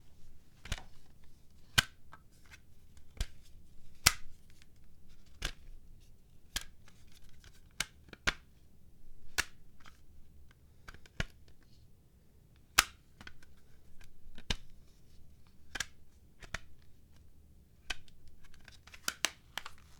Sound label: Cd Open And Close